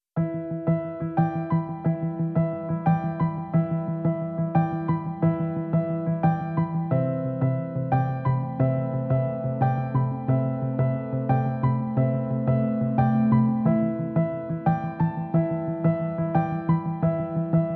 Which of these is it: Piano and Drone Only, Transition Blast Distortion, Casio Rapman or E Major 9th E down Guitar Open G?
Piano and Drone Only